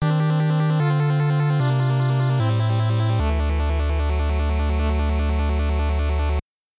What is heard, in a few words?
Appearance Fanfare (NES Ver.); Metroid in the Action